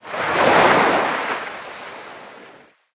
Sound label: Beach Wave